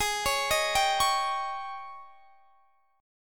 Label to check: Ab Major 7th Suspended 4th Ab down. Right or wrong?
Right